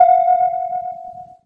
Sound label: sonar